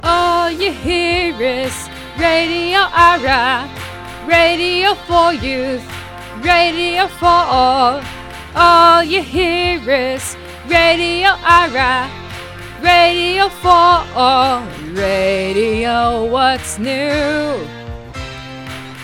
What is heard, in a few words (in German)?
Jingle DE MIX aus allen